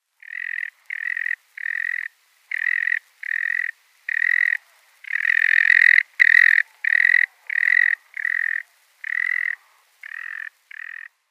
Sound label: Sanderling male display flight